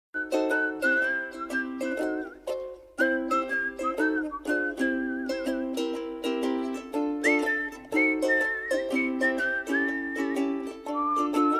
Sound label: Music Whistle Bird and Ukulele; Rayman Jungle Run